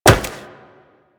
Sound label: Wall Jump Metal; Footsteps; Warframe; Tenno; Wall Run